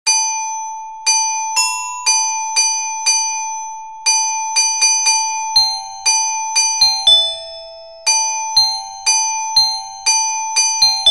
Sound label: kagome, orgel